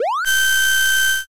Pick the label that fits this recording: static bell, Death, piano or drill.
drill